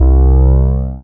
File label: SYN ANALO, dance; Sound & Vision - Gigapack II